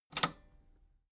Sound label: metal button robust spring release disable